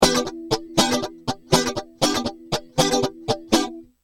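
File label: rhythm guitar, MXR PSD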